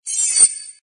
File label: Tone, Message